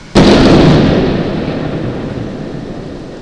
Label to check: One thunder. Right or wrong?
Right